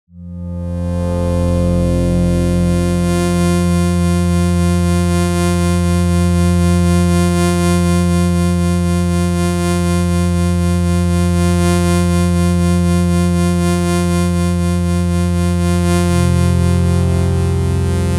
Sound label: Evolving Pad; Sound Particles SkyDust 3D